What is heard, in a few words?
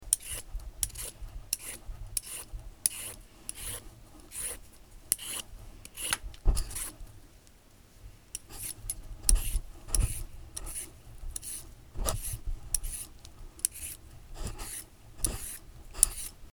carrotpeeler